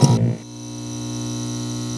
startup; D3DEMO

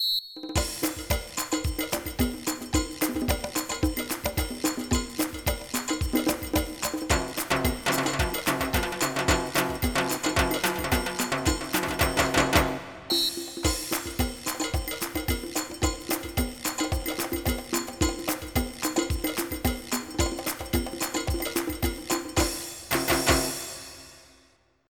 drum perc

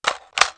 Get Guns; SWARM

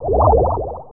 Underwater Laser; Realmz 2.0.4

